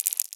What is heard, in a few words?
Cabas FX; EMU Orbit-3